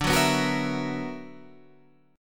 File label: B Minor Major 11th D, chord